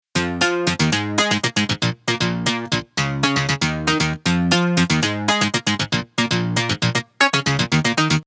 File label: wavestation